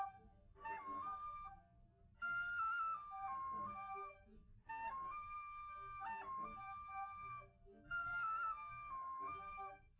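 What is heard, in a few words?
no drums, live recording 250ms 5cm; SiSEC11; underdetermined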